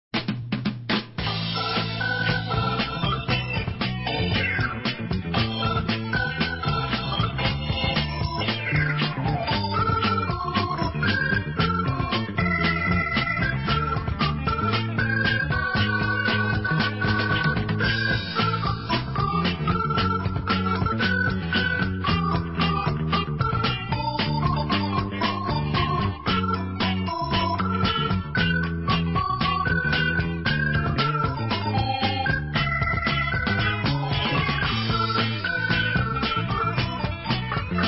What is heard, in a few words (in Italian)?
Ballabile